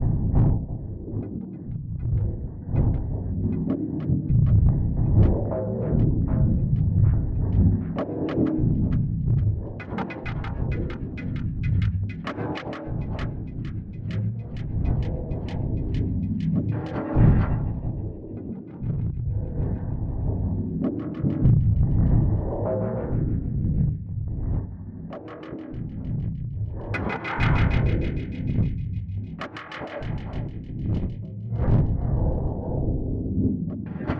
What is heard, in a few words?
Alien Engine